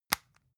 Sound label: spice jar close